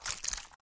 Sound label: slosh; Lewd SFX